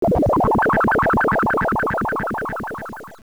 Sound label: Spell, casting a spell; Link casting a spell; AOL